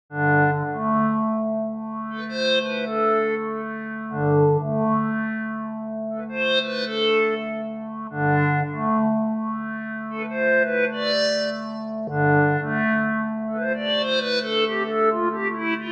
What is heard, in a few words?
Wind Synth